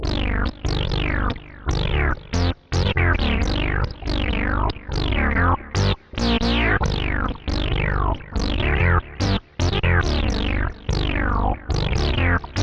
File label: korg g5 with boss oc 2, bass; KORG G5 bass synthesizer with some delay/chorus/verb for the first progression